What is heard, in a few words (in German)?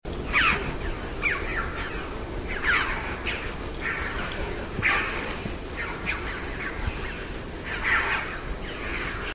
alpenkraehen